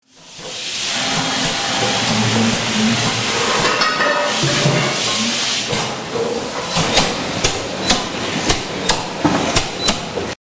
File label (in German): waschautomat; alpspektakel